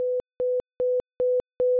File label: plus 1 dB